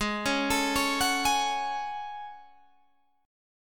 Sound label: A Major 13th, guitar